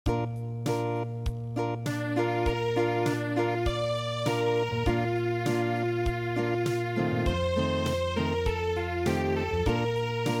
Acoustic

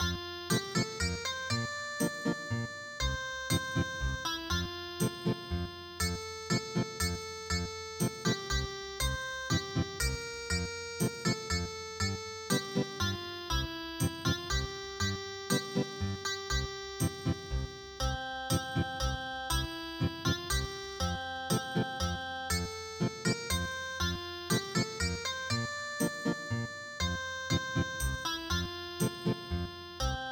Ethnic Guitar

